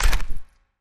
LARGE CREATURE FOOTSTEP, monsters

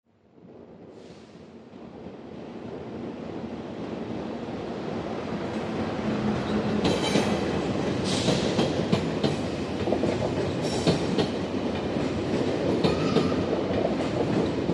Train Pass By Station

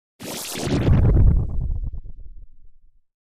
Wash Elect Flutter

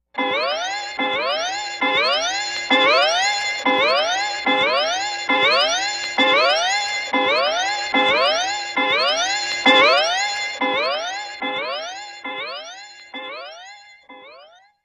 Crazy Clock Chime

